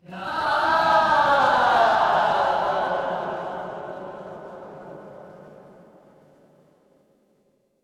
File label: crowd cheer